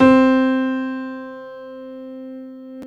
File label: Piano Grand; CMI